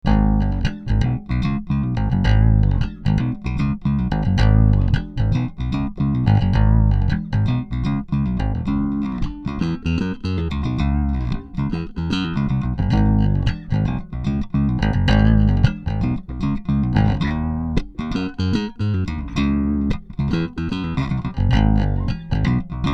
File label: riff